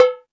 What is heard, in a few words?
Hip House, Drum Machines E-MU Pro-Cussion